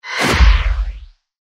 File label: trident rotate; Champions of Poseidon